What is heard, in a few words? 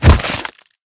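heavy crisp hit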